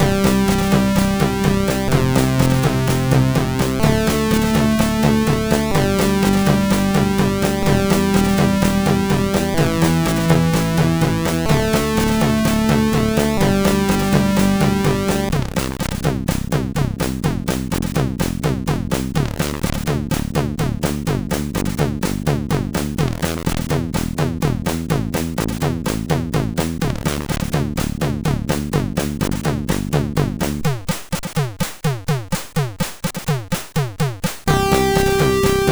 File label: Disco, MOS6581R4